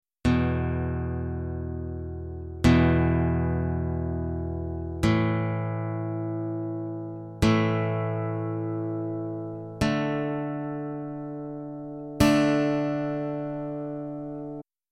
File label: power chords three strings, guitar